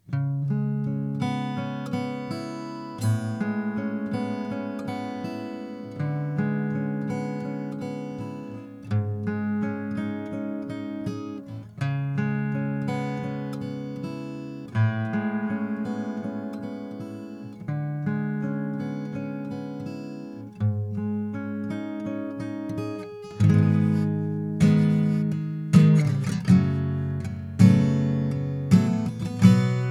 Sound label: E 82 GTR; Ludwig